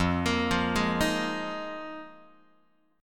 F Minor 11th, Guitar; Fm11; arpeggiated; JGuitar

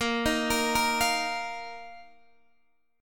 Bb Suspended 4th, Bb, down, Guitar, DADGAD; arpeggiated

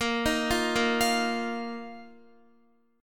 Bb Suspended 4th Bb down Guitar; arpeggiated; tuning 4E,4A,5D,5G,5C,6F

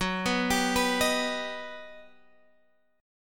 G Augmented Major 7th

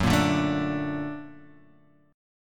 A sharp Augmented Major 7th

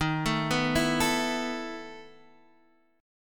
B 11th D sharp, chord

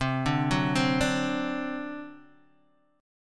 C Suspended 2nd Flat 5th C down Guitar Open D

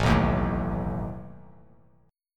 A Suspended 2nd Flat 5th A down Bass Standard; Asus2b5 strummed

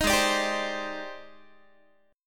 G sharp Major Sharp 11th, Guitar; strummed; 18,16,17,17,16,x; 4A,4E,5A,5D,5G#,6C#; G#M#11

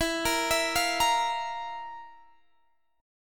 F Major 7th Suspended 4th E

